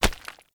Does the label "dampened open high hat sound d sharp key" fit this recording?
No